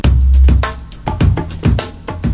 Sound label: 103 nice n slow dubbakick, DRUM LOOPS and BREAKS; 101 to 110 bpm